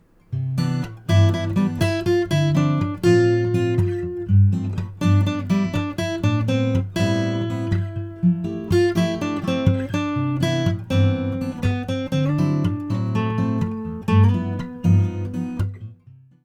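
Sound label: bossa guitar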